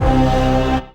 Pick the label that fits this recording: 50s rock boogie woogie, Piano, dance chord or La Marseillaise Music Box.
dance chord